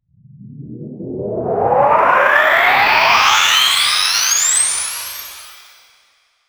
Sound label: riser, build-up